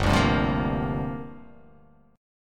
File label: G Minor 11th, chord; Gm11 strummed; JGuitar